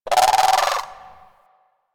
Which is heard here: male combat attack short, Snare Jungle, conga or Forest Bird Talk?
Forest Bird Talk